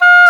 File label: Oboe D'amore; Roland L-CDX-03 Disk 1; Oboe 4-8